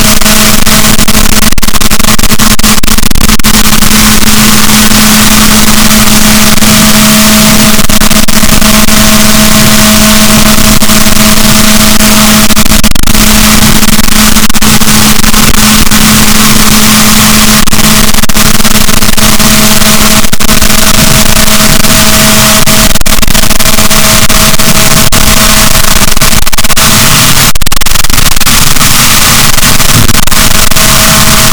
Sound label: rorquals, Balaenoptera Physalus, boat; rorquals positif; Chile